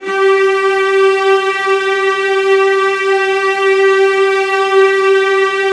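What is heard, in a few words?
VIOLAS A4; ILIO; Synclavier Strings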